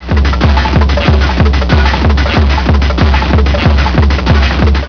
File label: A SaMPle of the uBiQ muZiK, TECHNO; Aix-Marseille